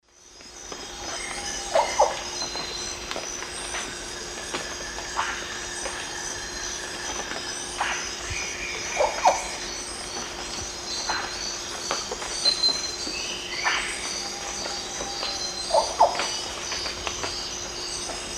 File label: Clypicterus oseryi, calls; S. America; Eastern Lowlands